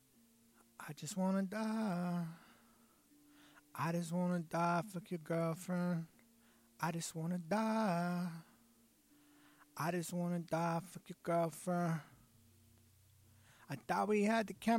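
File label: Hip Hop 80 F major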